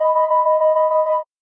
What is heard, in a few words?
Nokia Standard Mix